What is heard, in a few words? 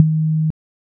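Vibration dot; Motorola E398 Asia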